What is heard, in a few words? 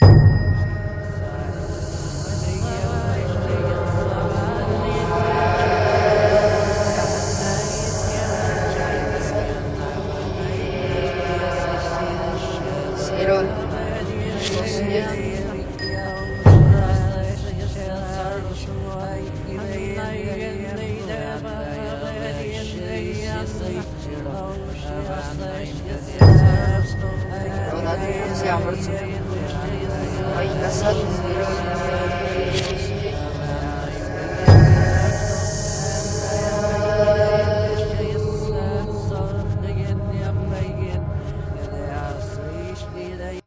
horror ambience stereo loop (whispering)